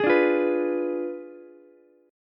G sharp Diminished 7th G sharp, Ukulele; G#dim7 strummed; JGuitar

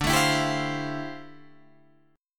D Minor 11th D down Guitar DADGAD

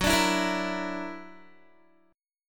Gb Augmented 7th Gb down Guitar 4E,4A,5D,5G,5C,6F; Listen to Gb+7 strummed

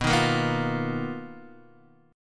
B Major 7th Flat 5th B down Guitar Drop D

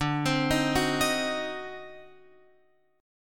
D 7th Flat 9th D down Guitar Open F; D7b9; arpeggiated

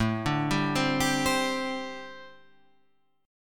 G Suspended 4th A, Gsus4/A chord; arpeggiated; JGuitar

